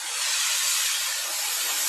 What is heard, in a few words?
BRUSH STIR; Ensoniq ZR-76